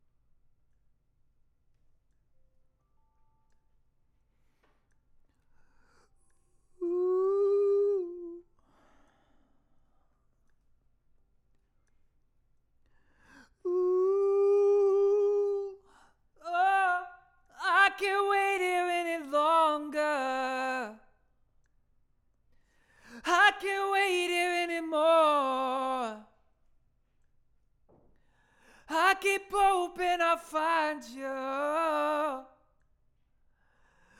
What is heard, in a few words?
Vocal